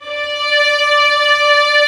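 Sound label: Vio legato D4; Digital Sound Factory Virtuoso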